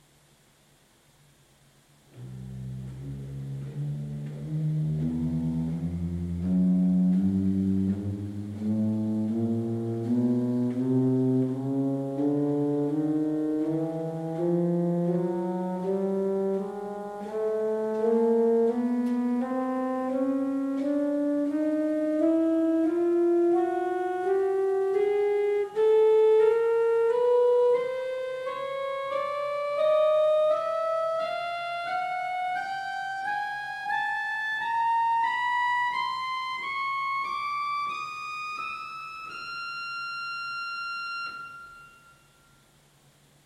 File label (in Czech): Manuál Principál 8', Ukázka zvuku, varhan; prospekt